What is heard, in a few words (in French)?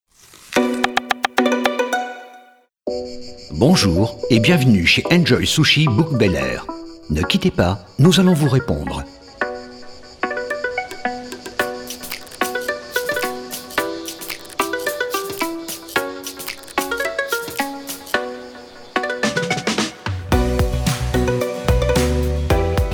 ATTENTE TELEPHONIQUE; ENJOY SUSHI; BOUC BEL AIR